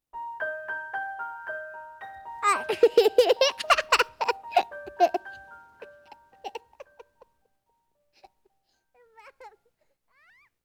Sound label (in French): Rire; Emilie Jolie (Version originale)